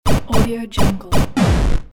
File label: 8bit fire